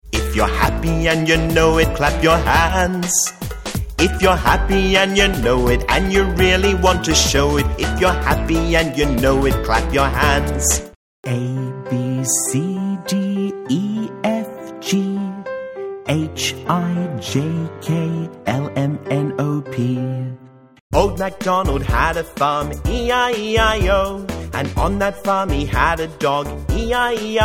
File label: Songs